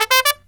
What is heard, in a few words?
TRUMP, Killer Brass Riffs